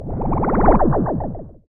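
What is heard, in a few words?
PER PROCU, Procussion, percussion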